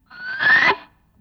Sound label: GTR, GUITAR FX